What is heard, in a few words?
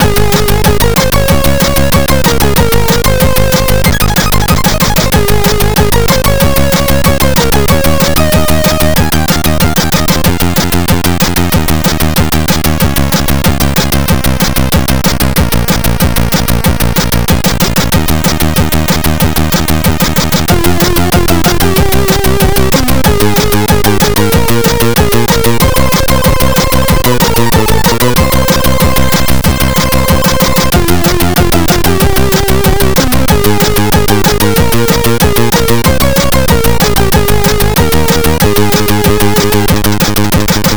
c64 in game; r type